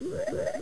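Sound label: rock monster; dungeonmaster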